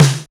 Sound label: tight snare drum sound c sharp key; snare drum samples